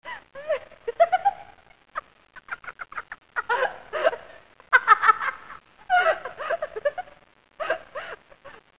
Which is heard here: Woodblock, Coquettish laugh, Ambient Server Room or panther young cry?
Coquettish laugh